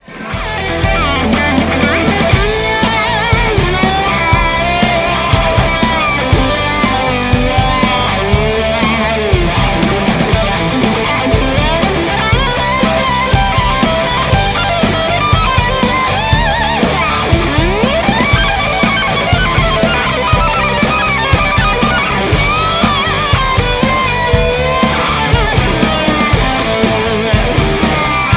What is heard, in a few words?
Solo